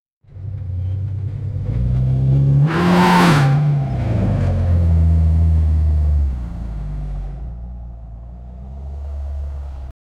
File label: shift gear sport car